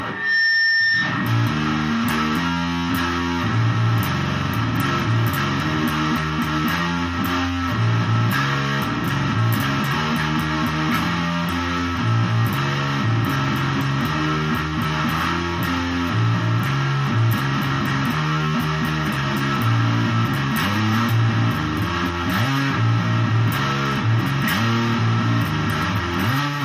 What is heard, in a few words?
riff; doom